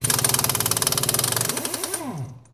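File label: Impact small, air tools, industrial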